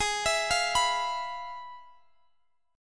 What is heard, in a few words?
F Minor Major 7th Flat 5th, G sharp